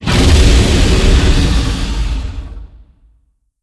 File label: Roar; Binah; blue archive